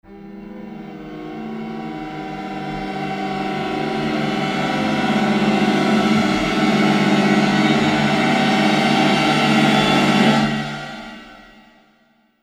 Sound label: string cluster